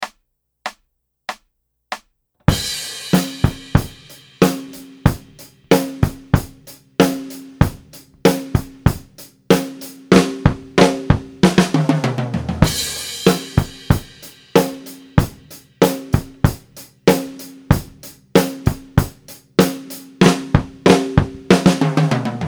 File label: Rock Fill; Daniel Glass